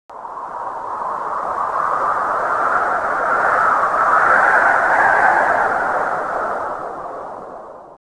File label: wind